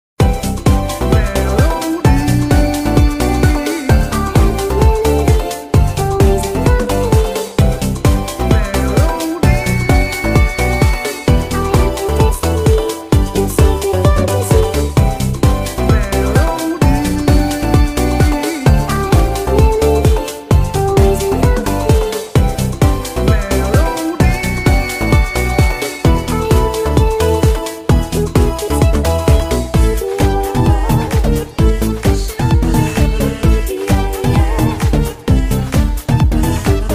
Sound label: music K-Pop